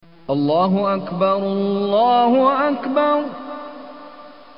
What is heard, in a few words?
adzan